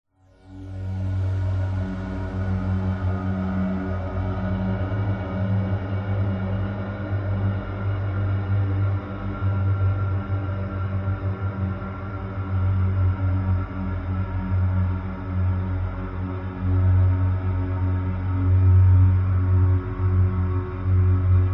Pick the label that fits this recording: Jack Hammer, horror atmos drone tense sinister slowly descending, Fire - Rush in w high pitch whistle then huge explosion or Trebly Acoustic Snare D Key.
horror atmos drone tense sinister slowly descending